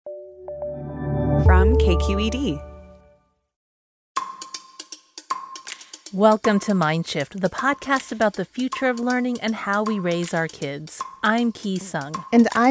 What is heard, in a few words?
bells; Mindshift